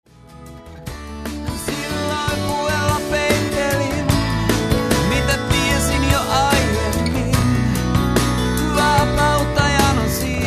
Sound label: unplugged